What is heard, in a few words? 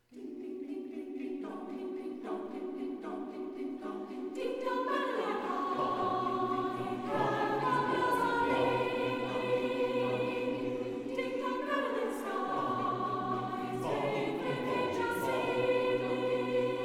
Ding Dong Merrily On High; glad tidings; schola